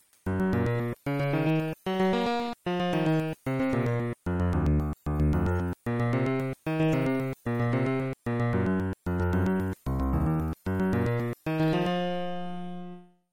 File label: Game Over; DecapAttack; Genesis